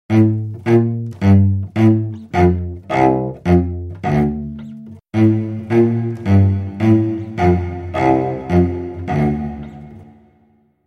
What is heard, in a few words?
Cello marcato